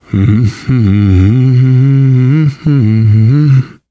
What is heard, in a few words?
nervous humming, survivor voice, biker